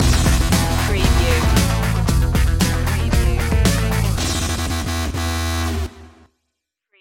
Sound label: last resort stinger